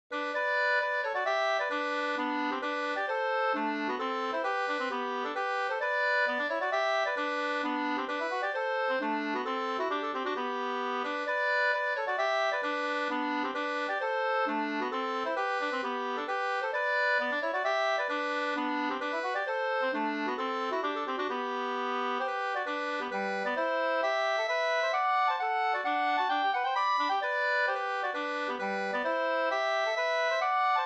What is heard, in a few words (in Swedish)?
hambopolska